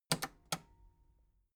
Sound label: metal button cassette rewind slow